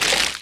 mined, mining; cuttlepop; Factorio

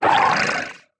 wriggler idle; Factorio

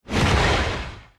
thruster mechanic move; platform thruster; Factorio

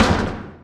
asteroid damage metallic small; Factorio

